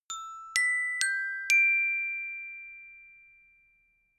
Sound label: notification glockenspiel dry sequence jump